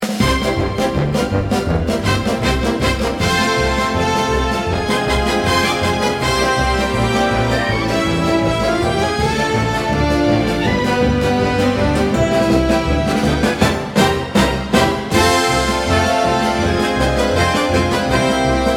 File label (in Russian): канкан, piano